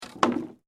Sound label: WOOD PLANK MOVEMENT